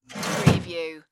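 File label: Cash Register Close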